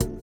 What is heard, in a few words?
VIBRA; Spectrasonics; Metamorphosis